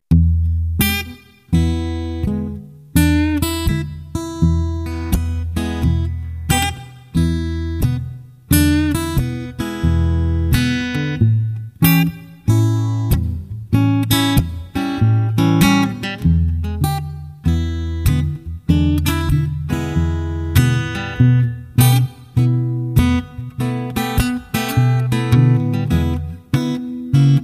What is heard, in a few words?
Blues slow, Guitar songs